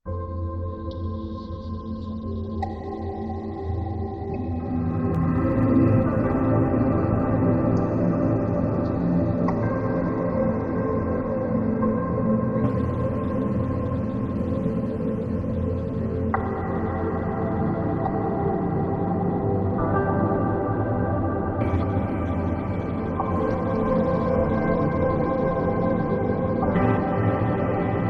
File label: Sleep Soundscape; Endel